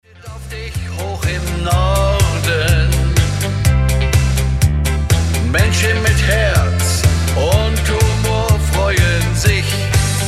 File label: Samba Samba